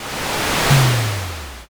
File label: fire magic